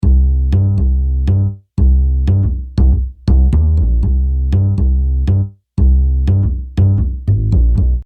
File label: Bass